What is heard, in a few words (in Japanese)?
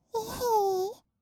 いひー, kawaii voice; 1200 kawaii voice!!; 色々なワンショット